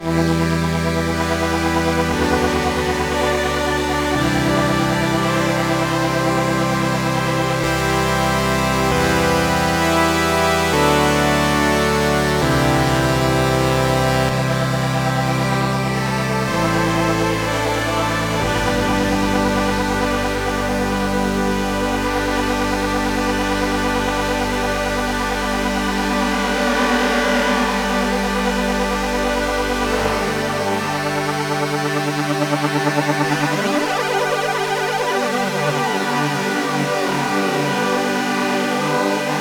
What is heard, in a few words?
FX CHORUS Plus tweak, synthesizers; Redshift 6